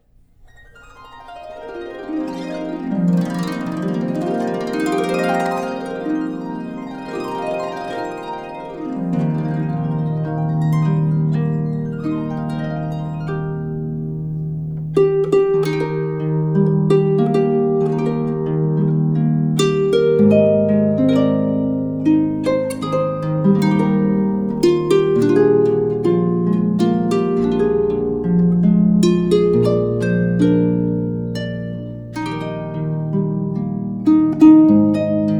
brahms, harp

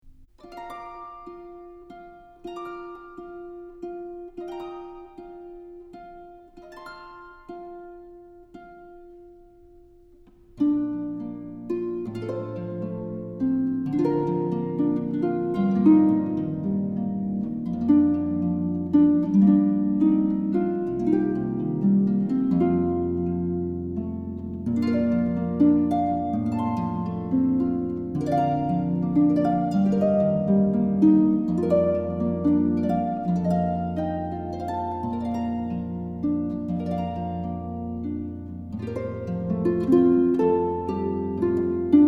Edelweiss

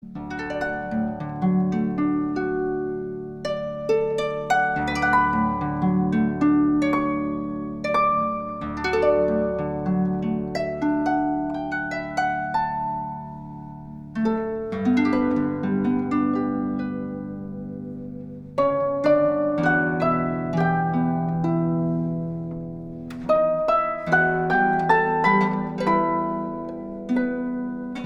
harp; Meditation from Thais

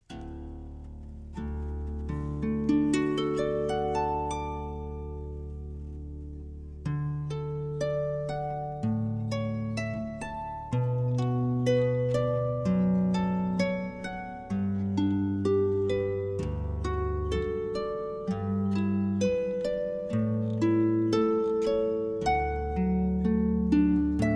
Canon in D, harp

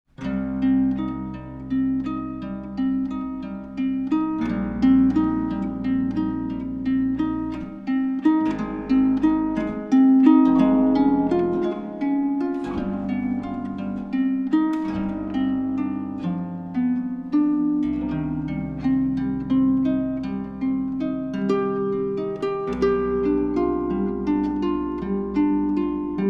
Moonlight Sonata 1st; classical favorites cd

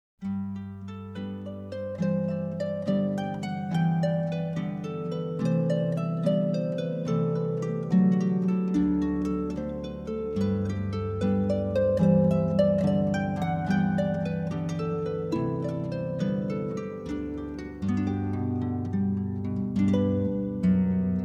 Jesu Joy of Mans Desiring, harp

